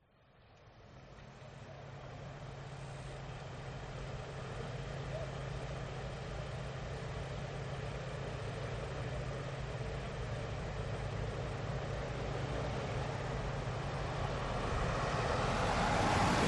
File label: traffic cars bus pass by few people usa aspen colorado